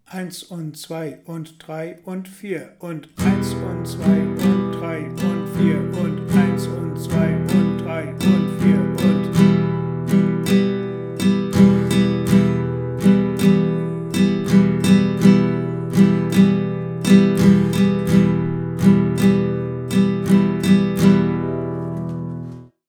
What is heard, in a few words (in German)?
Schlagmuster